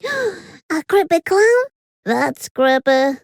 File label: creepy clown